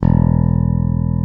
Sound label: EBASSP E1; AKAI S6000 CD-ROM - Volume 1